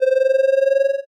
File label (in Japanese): purupuru